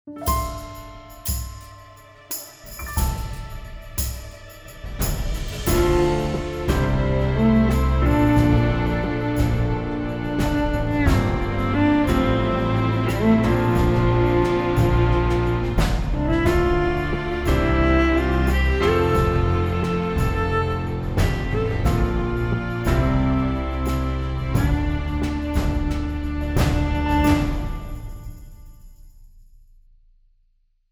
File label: legato full; A simple yet effective method for more natural legato sampling; Orchestral example